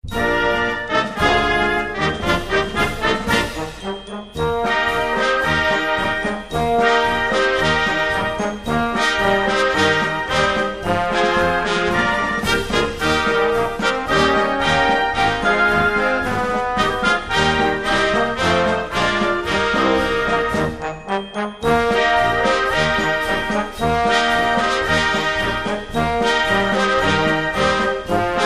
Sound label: Happy Marching band; marsze; happy marching band nr 1 rundel s